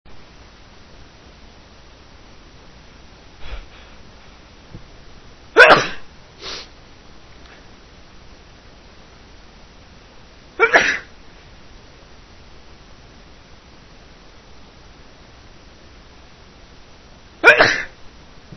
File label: gonna sneeze, male